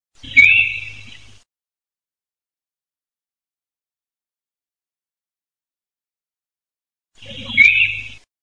cervo sika alarm call

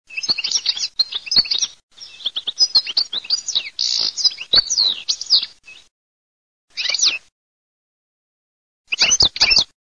CARDEL PRIMAV

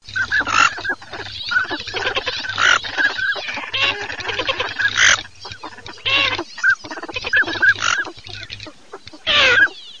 marzaiola fischione mestolone, birdsound

